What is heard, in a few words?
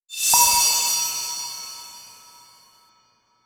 Dialogue Success; helltaker